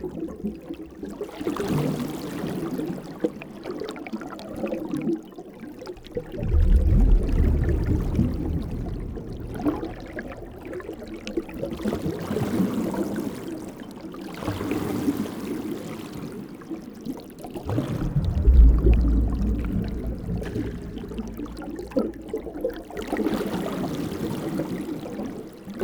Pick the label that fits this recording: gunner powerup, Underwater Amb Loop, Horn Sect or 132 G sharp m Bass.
Underwater Amb Loop